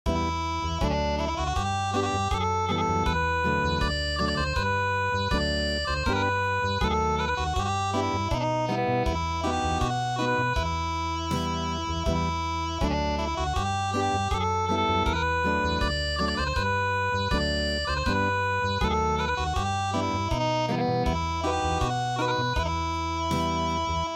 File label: Unplugged, midi